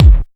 studio kick drum sample F sharp key; kick drum samples

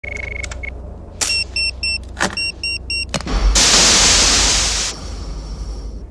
radio bleep and hiss crash sound

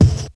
eighties natural bass drum single shot b key

